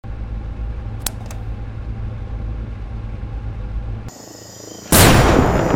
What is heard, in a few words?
Off Button (Engine Room)